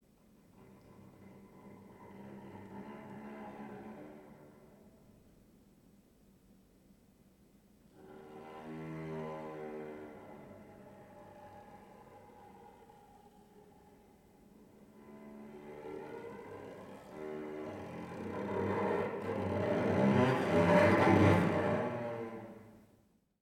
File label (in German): Fluss Mammut, Mammoth